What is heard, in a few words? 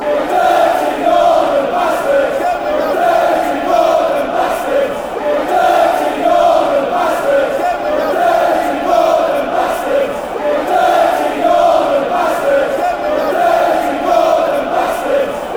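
you dirty northern bastards, fanchants; ytfc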